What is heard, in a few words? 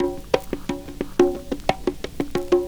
PERCS, 70 s Breakbeats